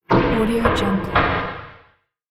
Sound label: machine movement